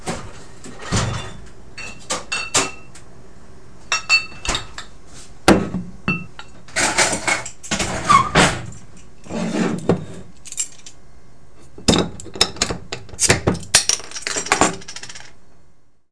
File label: BOTTLE